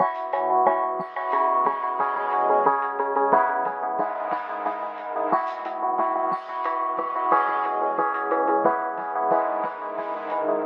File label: 090 ambient strings n melody; SAMPLED MUSIC LOOPS